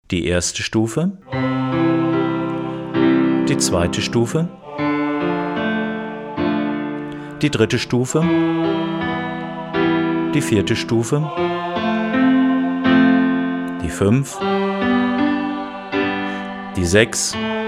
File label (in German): Akkordstufen